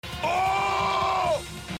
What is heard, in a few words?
OOOOOH